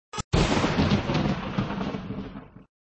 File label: Thunder; flipper